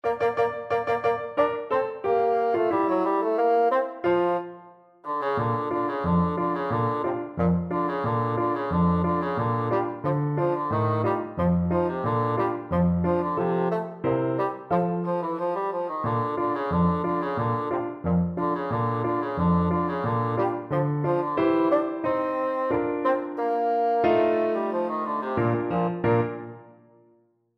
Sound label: radetsky march, bassoon